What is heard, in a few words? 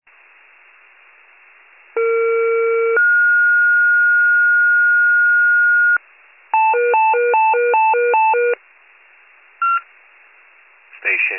fire, pager alert